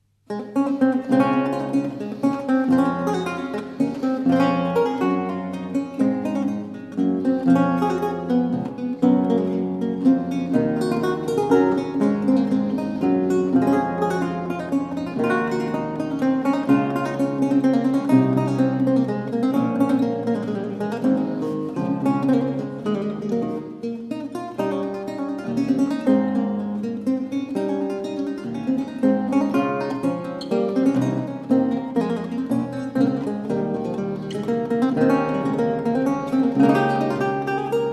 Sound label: sonata in D major Courante; weiss